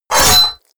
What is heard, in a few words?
sword pull out squishy